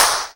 Claps & Snares

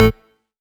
Synth Shot A; Mutekki Media; Vengeance Electroshock Vol.2

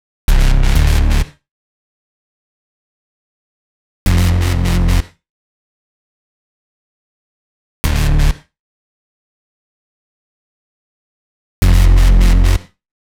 128 Dm Bass, Complex Electro Synths; Prime Loops; Deus

